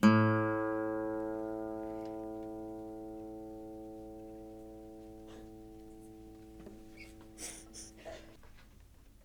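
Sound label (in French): Electroacoustique la, guitare électro acoustique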